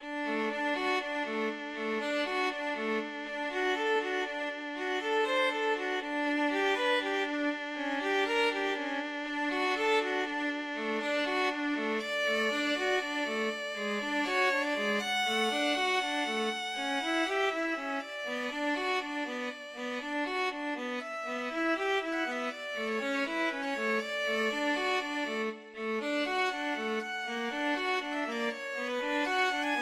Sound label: schubert ave maria, violin duet